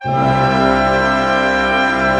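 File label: pentatonic C fortissimo, orchestra; Sound On Sound - Peter Siedleczek's Orchestra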